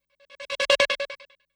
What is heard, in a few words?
Strobe Transition